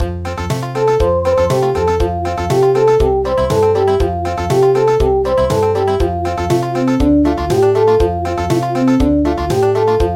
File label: Country, traditional; Put in the Earring